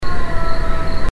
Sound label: call short